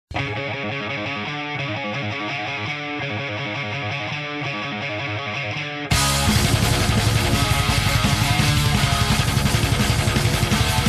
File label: Sad statue